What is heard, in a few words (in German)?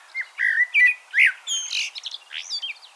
amsel